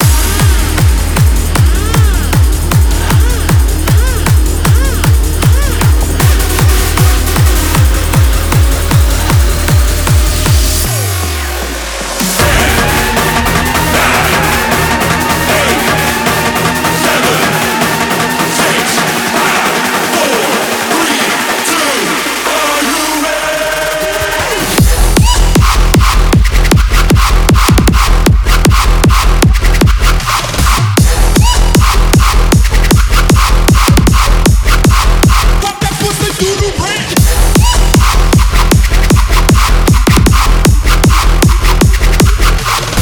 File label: Mashup